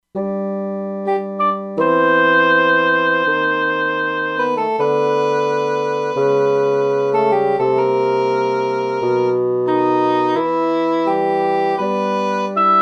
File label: Dbl Reeds-Hrn, E-mu Proteus-2; Factory Presets